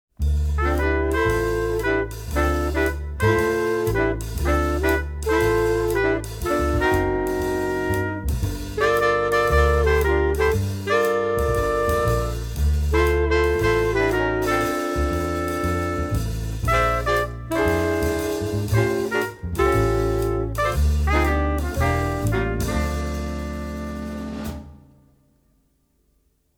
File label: Close Position; Example 2a; Bill Dobbins